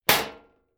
club hit sheet clank hard

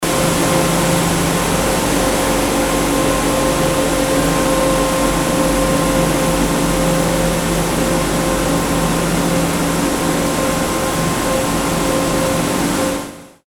machine hall

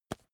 magazine tap hard

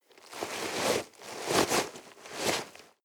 nylon leather walk

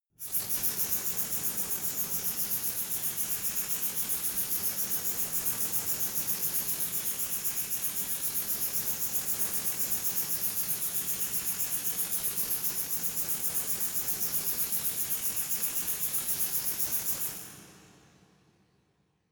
resonator rattle slow

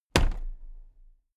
toolbox place upright heavy

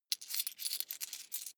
coins clatter medium

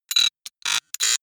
sci-fi electromechanics small stutter evil

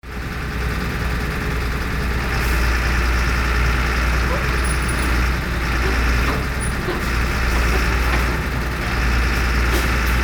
unloading ice from a truck

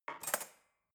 cutlery fork drawer handle soft

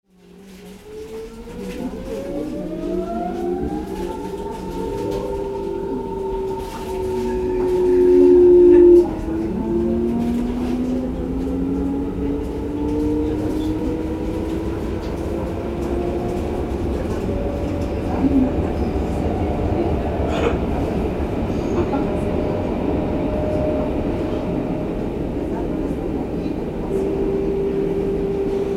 suburban railway cabin inside

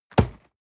box hit hard rustle

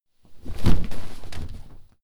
clothes hit drop furnitures

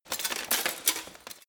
walk single

